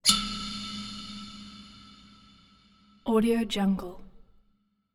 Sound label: tension waterphone tine scrapes impacts approx pitch g3; soundmorph